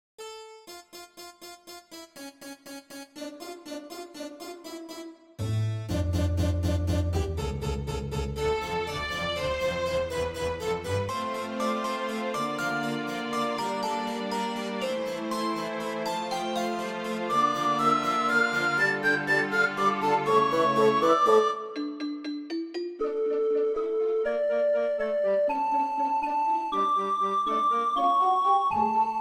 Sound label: orch, originally just for harpsichord; Sperrhake; A simple piece I made for a friend of mine last year, originally just for harpsichord.